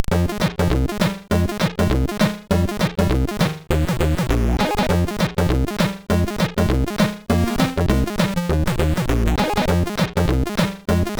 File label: guitaring, SIDTunes